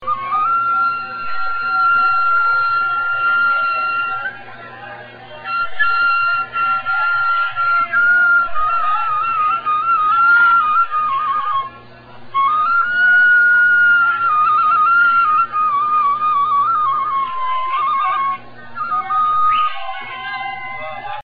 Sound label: iraqi sad music ringtone